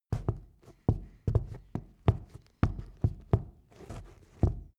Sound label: step wood walk